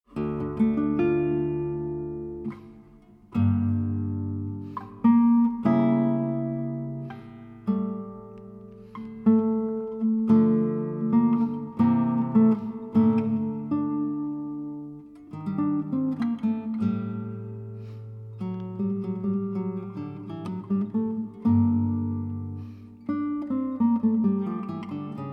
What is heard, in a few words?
Sarabanda, Guitar Version; Johann Sebastian Bach